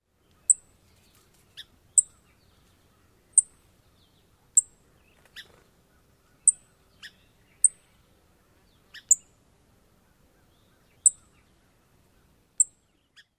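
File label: tinks and soft chimp calls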